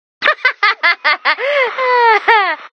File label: Laugh; Canny